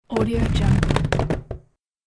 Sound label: stretching dumb plastic